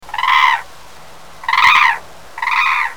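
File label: nabezuru, hooded crane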